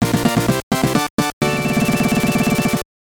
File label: Level Complete; bomberman; turbografx 16